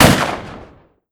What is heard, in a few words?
shotgun; SupaLidlGame